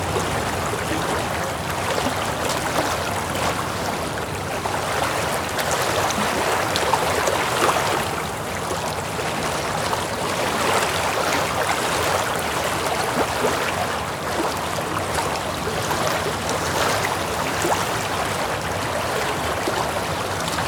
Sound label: water canal